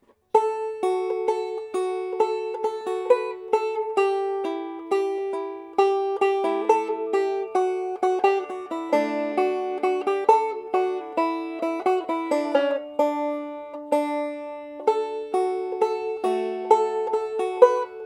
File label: Mississippi melody